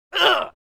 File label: male grunt punch; Grunts; Butterfinger Video Sound Effects